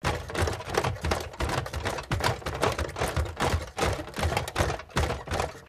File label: gunstand tripod loop; gow; turret judgement